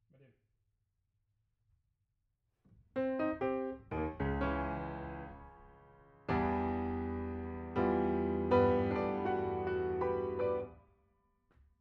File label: Piano B